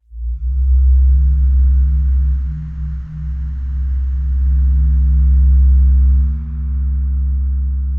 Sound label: Drone; Laboratory; Shoegazer